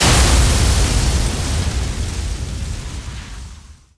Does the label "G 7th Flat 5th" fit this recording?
No